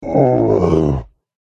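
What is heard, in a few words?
hydra wound; gidra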